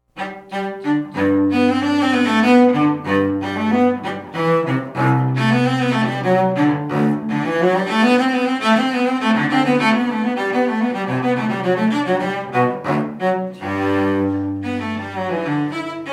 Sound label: Suite 3 Courante, cello